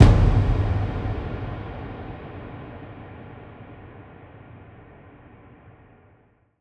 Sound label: Orchestra Hit